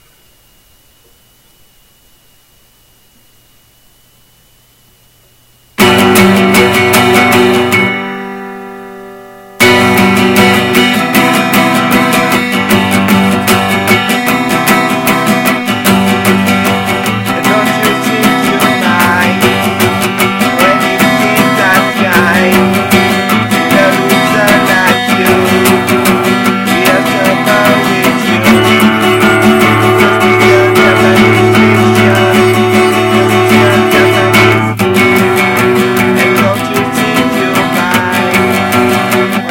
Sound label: Acoustic Session